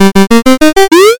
picoquestion

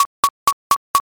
calculator

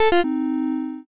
eliminate

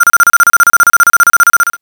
trainbell

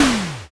water balloon

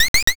mouse

